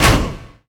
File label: Stomp; Detroit Stomp